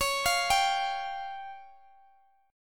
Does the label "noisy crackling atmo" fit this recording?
No